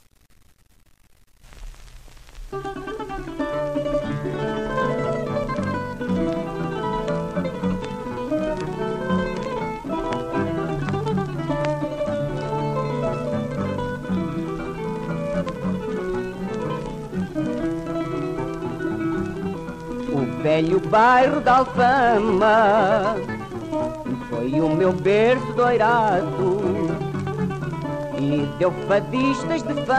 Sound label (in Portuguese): Fado